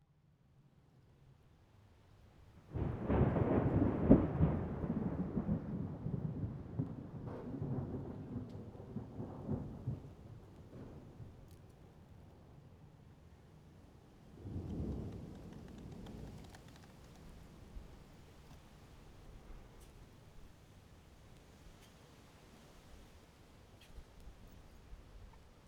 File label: thunder; ambisonic